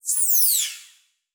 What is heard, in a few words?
Multimedia Internet CD-Rom Flash Whoosh Short